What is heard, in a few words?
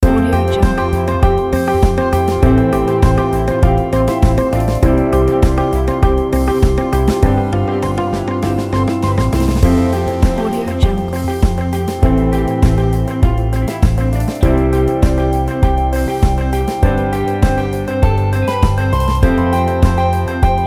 short positive innovative corporate motive; musicalfunnygirl